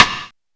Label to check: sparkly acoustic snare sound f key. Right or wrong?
Right